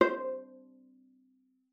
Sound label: C5, VIOLA PIZZ; Xsample VOL-5; 90 s Sample CDs